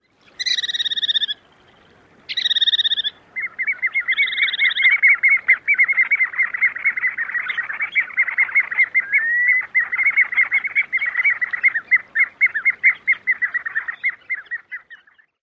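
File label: encounter calls, Piping Plover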